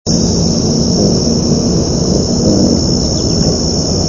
hummingbird wings beating, ruby; birdsongs

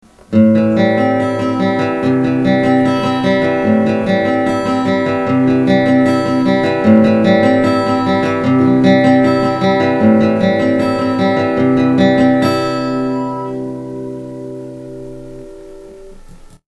playing classical guitar riff

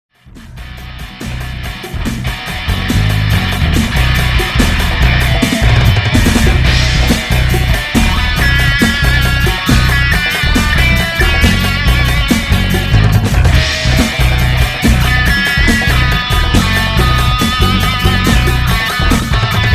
guitar solo

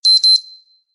Beep Beep

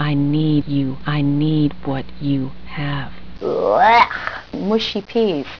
hehe

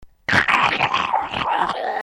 choke

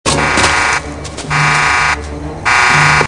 Fence Alarm

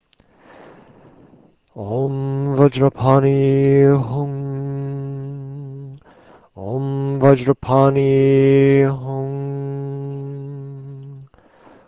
Om Vajrapani Hum, mantra

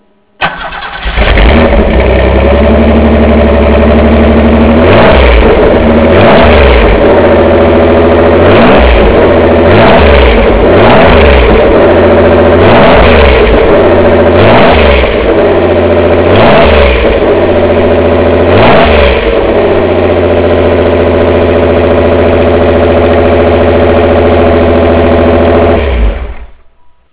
Engine

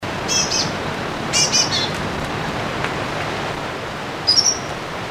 White bellied Yuhina

